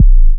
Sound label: kick